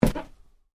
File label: Footstep wood light, Shoes: Individual Footstep On Wood, Light; Footsteps; Human; Sound Effects:Human; Stuart Duffield